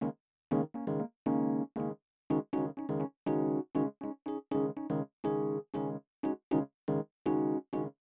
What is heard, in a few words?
synth; bombogroove 11